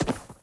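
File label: item generic up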